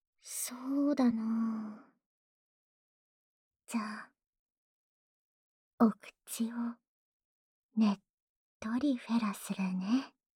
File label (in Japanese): ダウナー ねっとり口フェラ, ASMR; フェラ素材